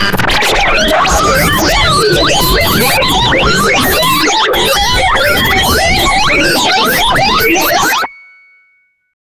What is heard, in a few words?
sci-fi